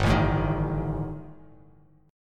G Suspended 2nd Sharp 5th G down Bass Standard; Gsus2#5 strummed; JGuitar

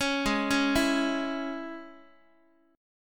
Ab Suspended 4th Sharp 5th, Guitar; arpeggiated; JGuitar

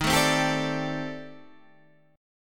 C Minor 6th Add 9th Eb

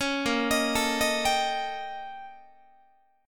Bb Minor Major 13th Bb, Guitar; BbmM13; arpeggiated; 16,18,18,19,19,18; JGuitar

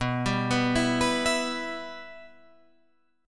B Suspended 4th Sharp 5th B Guitar Open F; Bsus4#5 arpeggiated; JGuitar

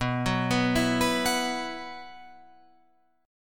B Suspended 4th, Guitar, Open F; Bsus4; arpeggiated; 11,13,11,11,14,13

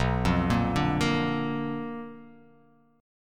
B Minor Major 7th Flat 5th, chord

